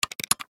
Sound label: Button Switch Gear Multimedia; Web Interactive; Blastwave; Technology